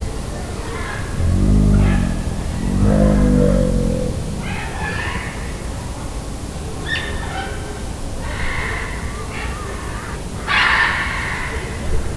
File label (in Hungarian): egypupu teve szelvedo; állathangok; nyíregyházi zoo 2010